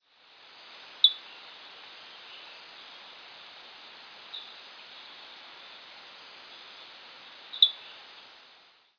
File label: Eleutherodactylidae Diasporus diastema